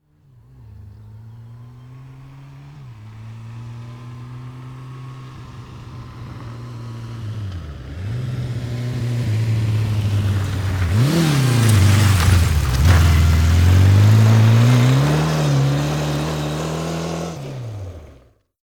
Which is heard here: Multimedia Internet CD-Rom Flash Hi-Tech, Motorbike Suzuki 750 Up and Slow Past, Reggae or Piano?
Motorbike Suzuki 750 Up and Slow Past